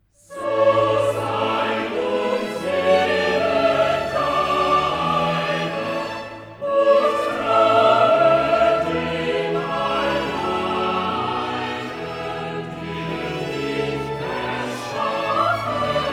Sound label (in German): Choral, cantatas; Versus IX; So sei nun Seele deine; Bach; BWV 97